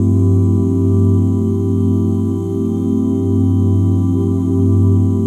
OOH G MIN9; OOH CHORDS; ILIO; Vocal Planet; Jazz & FX

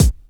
DR. LOOP; X-Static Goldmine 3

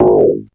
tuners

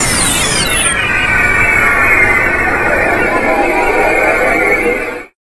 FX SWEEP, SYNTHSWEEPS

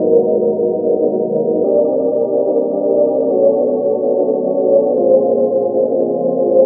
Pad 144 BPM D#, Club Techno; Zero-G; Groove Loops

